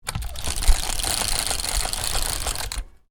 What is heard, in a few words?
Roller blind up, Roller Blind: Domestic, Roll Up With Cord; Miscellaneous Household